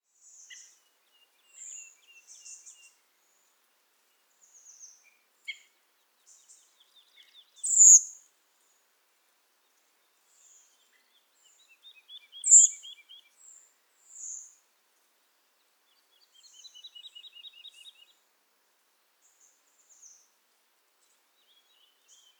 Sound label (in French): grives mauvis tssii chant alouette lulu; Le Puech